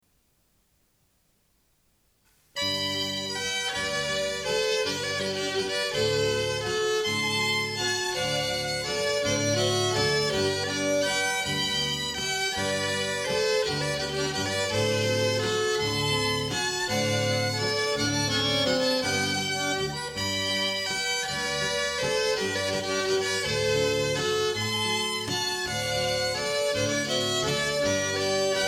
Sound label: Vals